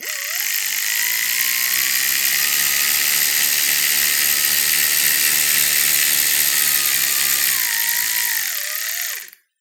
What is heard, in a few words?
whir up WHR